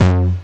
BD Distortion, Bassdrums; Vengeance Samples

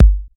Bassdrums; Vengeance Samples

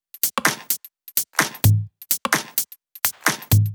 Drumloops 128BPM

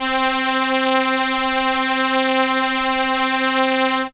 Viola C4, synth, ARP Solina; musicradar-synth-samples

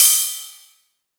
Cymbals Ride; Vengeance Samples

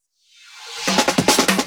Loops Fills; Vengeance Samples

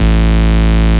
Bass Root A

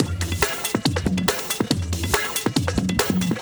Breakbeat; Vengeance Samples; VEC2 Loops

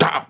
Vocal Oneshots; Vengeance Samples; Vocals

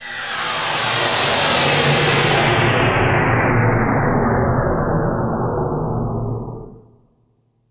Downlifter

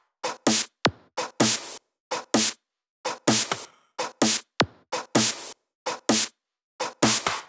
128BPM Drums; Vengeance Samples; Zoo Kit

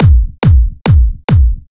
Kick, 140 BPM; Vocal-Hook Kits; If You Know

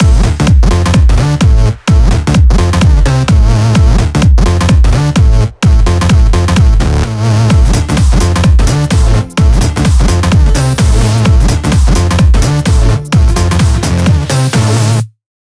128BPM Mix; Vengeance Samples; VFH1 128BPM Wanted Kit

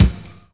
70s and 80s Kicks; Vengeance Samples

